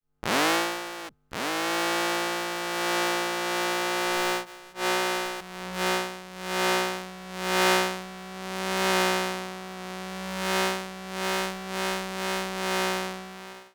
EMF Nose Trimmer, Electromagnetic Field & Emissions; Fox Audio Post-Production